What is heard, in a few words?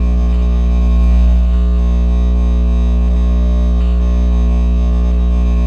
Electrical Buzzing Loop; Industrial; the-ruined-city